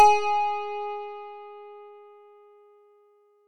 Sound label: synth, kantele